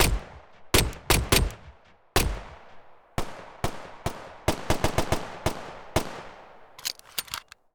Weapon 9mm Pistol

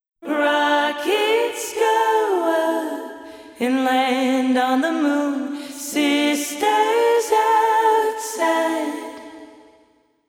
Vocals Pro R 2 Vintage

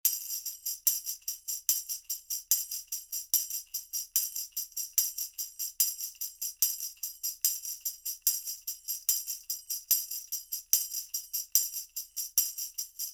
tambourine cardioid; microphone polar patterns

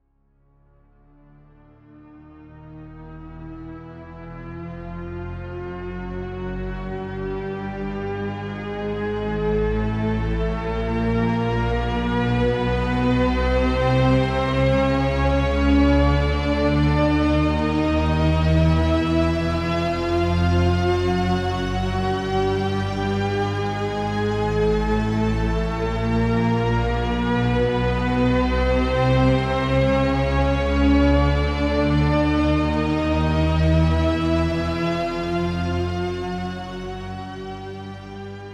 String Ensemble Shepard; NI; Factory

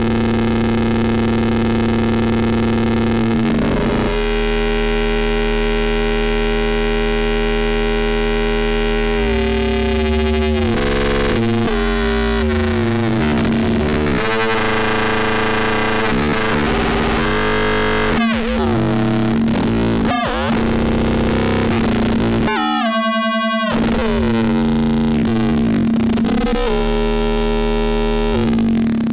Drone rungler benjolin

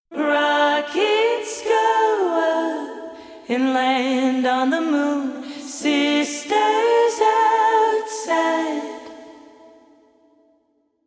Vocals Pro R 2 Plate

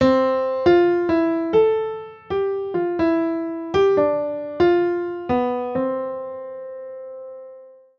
single note major

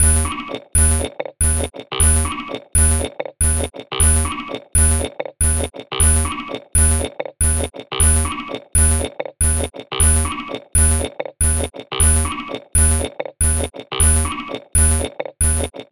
Modular; sampling instrument; Rando by Monkeyc; Atlas

